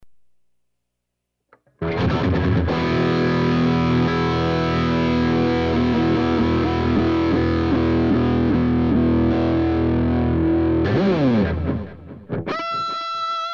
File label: lead